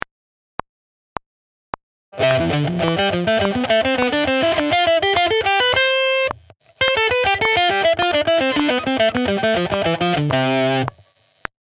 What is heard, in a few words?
Hybrid Picking